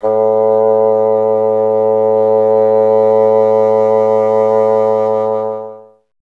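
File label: wind bassoon A; Miroslav Vitous; Solo Instruments VOL-1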